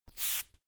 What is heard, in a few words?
wipe; physioex9